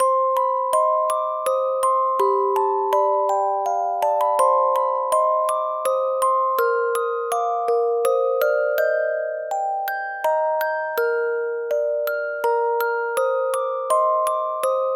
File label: musicbox folia; オルゴール